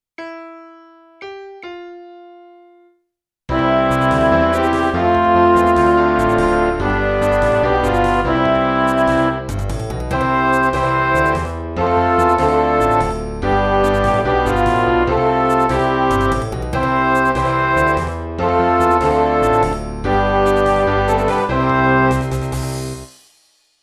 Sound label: amen midi